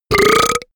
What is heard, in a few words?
monster burp; comedy-cartoon; soundpool